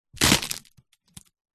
wood split crack break splinter

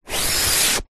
leisure inflated pool toy rubber slide on wall

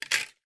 household coathangers x2 hit; sound effects one

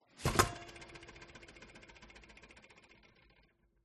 small flour bag on scales

